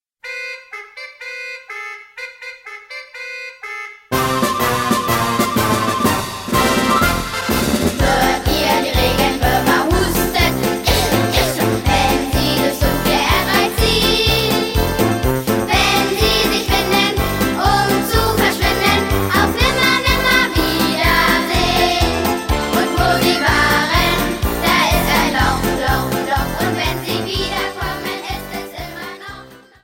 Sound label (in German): Kinderhits Partylieder; tiptoi